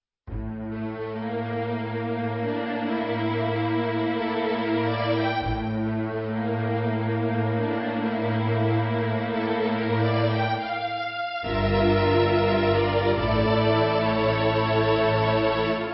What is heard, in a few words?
Adagietto for Strings